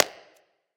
clap; smite; heimdallr

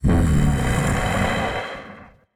breath; Smite; baronsamedi

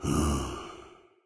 idle breath out; smite; god odin

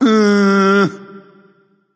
tongue; smite; ares

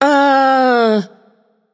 notice; babayaga; smite

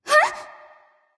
nv surprise; smite; awilix

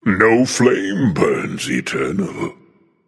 death; Smite; ymir skin14

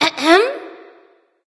clear throat; smite; voicepack; neith

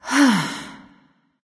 sigh; smite; isis

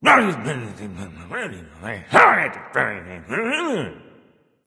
grumbling; chronos

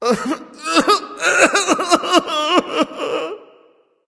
boohoo; smite; thanatos

